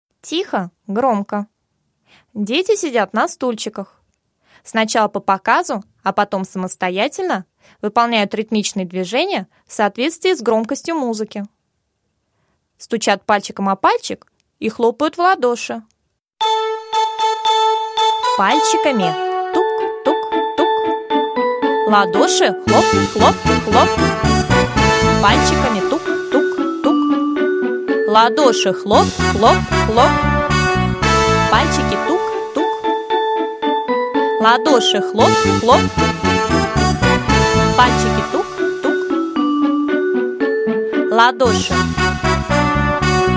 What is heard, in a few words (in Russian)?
Ритмика для малышей, Тихо громко